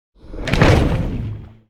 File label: torpedo; World Of Warships